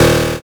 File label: zap